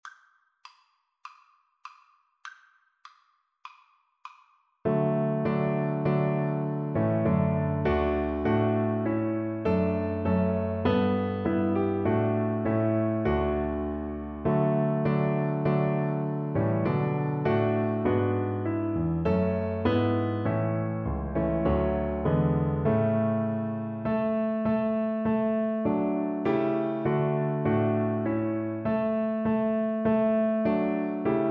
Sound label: DB, Double Bass